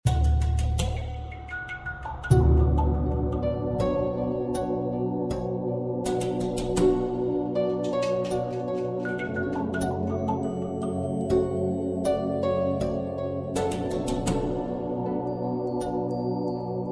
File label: bgm